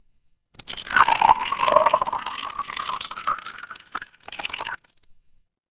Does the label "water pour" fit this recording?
Yes